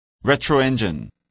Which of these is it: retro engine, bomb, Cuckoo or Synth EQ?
retro engine